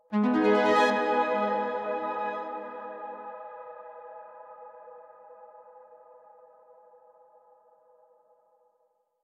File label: Mechanic Progression Stinger